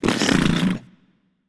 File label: nv tongue; smite; voicepack; hachiman